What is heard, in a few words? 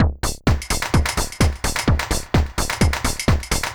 Beat 128BPM (Full No Kick), techno; techno drum fx